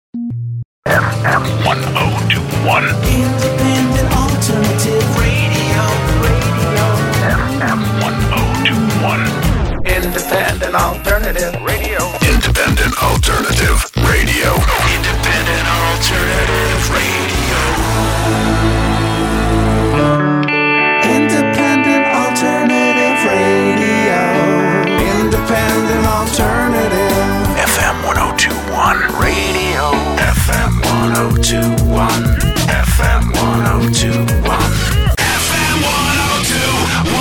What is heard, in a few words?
Jingles